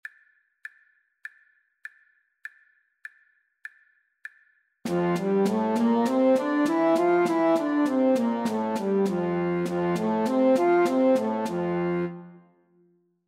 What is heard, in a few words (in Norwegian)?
F-dur natura fort